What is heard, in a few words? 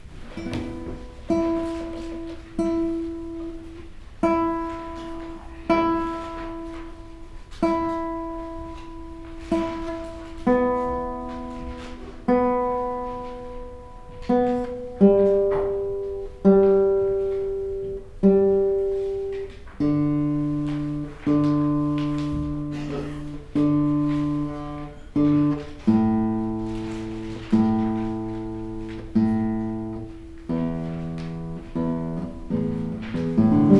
guitar; ZOOM M2 MicTrak